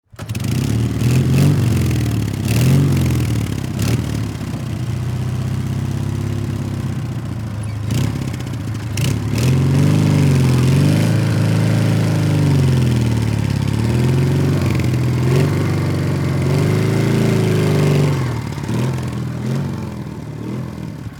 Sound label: Vehicle Motorcycle Start Idle Off BMW R71 Military World War II